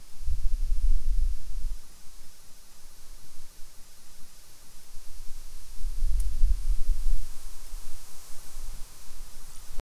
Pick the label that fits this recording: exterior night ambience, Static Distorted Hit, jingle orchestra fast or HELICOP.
exterior night ambience